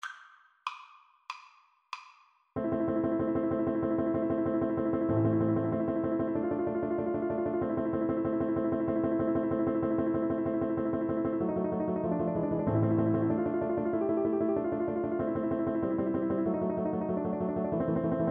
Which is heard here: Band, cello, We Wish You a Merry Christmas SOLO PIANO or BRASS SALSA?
cello